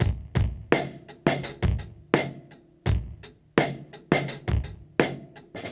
Beat Loops; Zero-G; Monster Beats

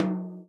tom, Real Drum Kit, jungle kit

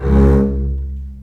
String Collection, MARCATO

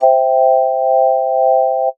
Lite Organ Chord, Old Skool House; Big Fish Audio; OSH Chords

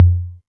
Tom Lo, Novation Drumstation; DrumstationV1

